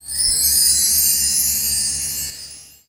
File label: BEL, Hip Hop and R&B Drumloops; Best Service; ProSamples vol.01; AKAI